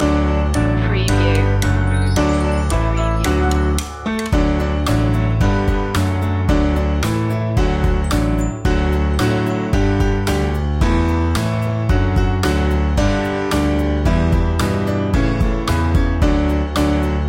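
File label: bingo instrumental loop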